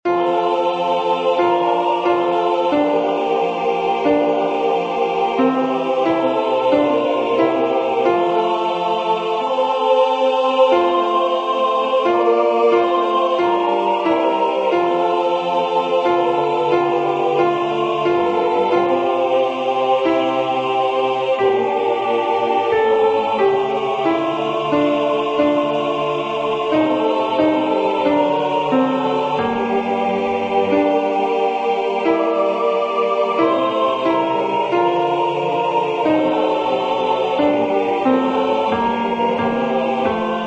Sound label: Alto; Soul Of My Saviour